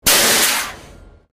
Air Release Quick Air, steam train

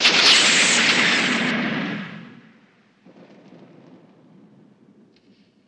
Lightning Strike

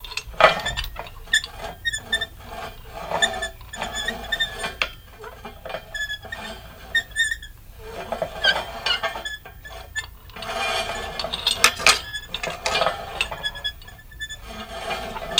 Metal Scrape Creak, pulleys

